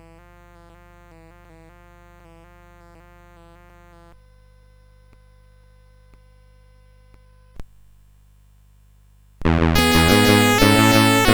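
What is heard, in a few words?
Commodore, CSG8580R5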